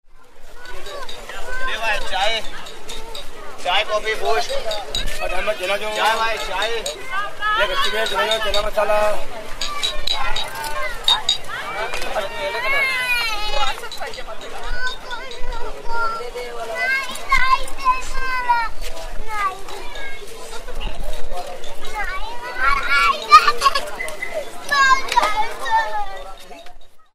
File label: marine drive, sounds of mumbai